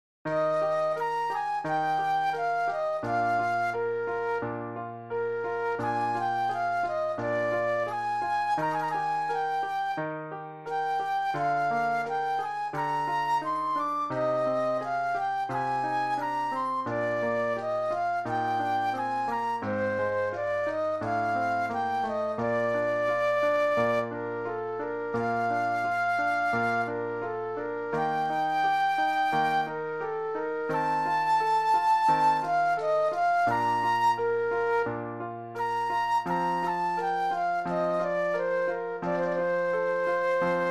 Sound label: Flute Solo; classical